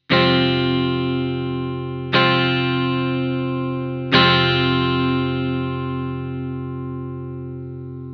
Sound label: chord f minor, guitar